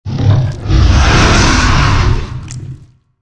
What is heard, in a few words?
idle, dragon